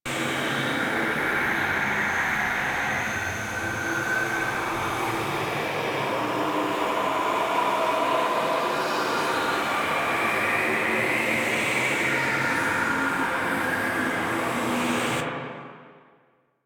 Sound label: Swarm Screen Scan Loop; Warframe; Entrati